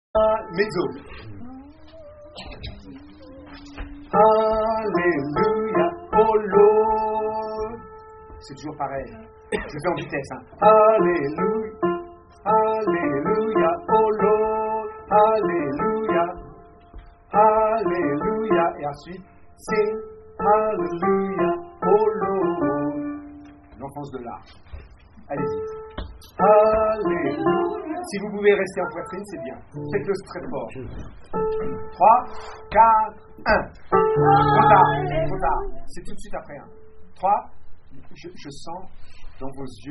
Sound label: mezzo